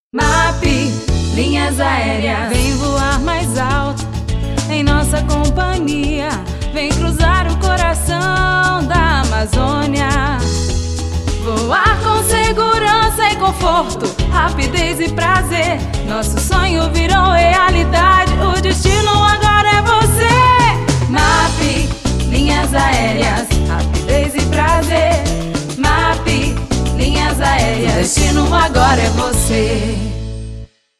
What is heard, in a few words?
Jingle; MAP Linhas Aereas